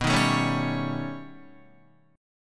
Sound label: B Augmented 9th B Guitar, chord; strummed; JGuitar